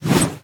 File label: Damsel Of Distress; gamerip